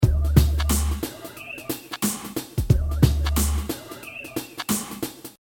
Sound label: Hip Hop Drum Loop 90 Bpm Swing; Everybody Now Swing With Screech